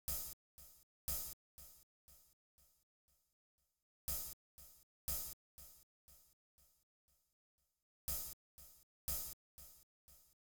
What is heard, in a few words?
Drum Loop 120 BPM Open Hat; Cymatics; Vibes II Drum Loop Collection; Bright Drum Loop